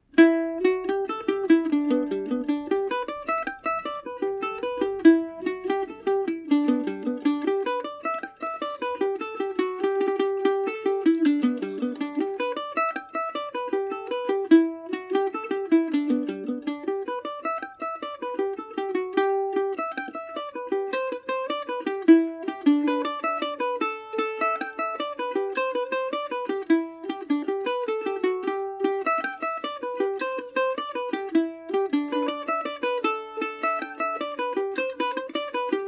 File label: catrionas jig G major, irish mandolin